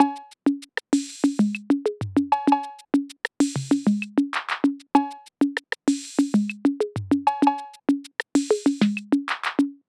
Percussion Loop - 97 BPM; Check; Cymatics; ANALOG EVOLUTION Beta

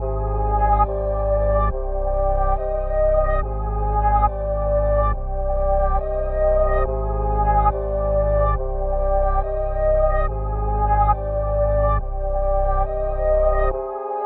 140 BPM C Min, Melody Loops - Drill; Reaper

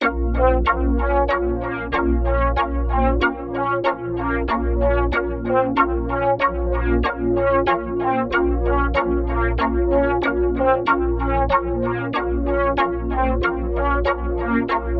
94 BPM A# Min, melody; Prophet

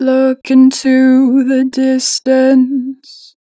Natural Vibrato, Vocals, 2 bar vib; Distance; Harmor Vocals

